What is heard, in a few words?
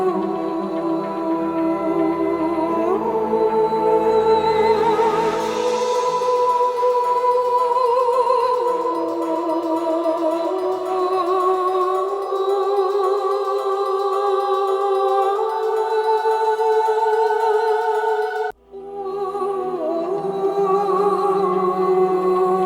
Death Loop, Music; Warframe; Duviri; TennoCon2022